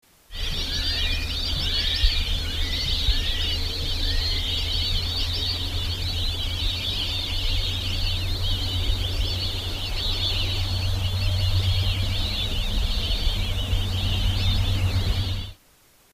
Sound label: white-faced whistling duck